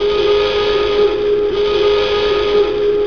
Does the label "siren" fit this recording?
Yes